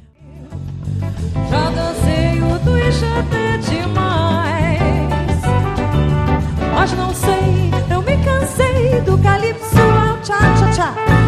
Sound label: Brazilian Band Cha Cha Cha